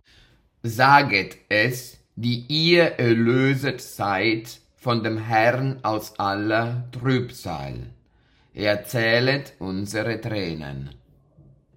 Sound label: Chor